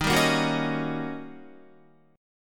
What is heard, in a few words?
D sharp 7th Sharp 9th Guitar; strummed; JGuitar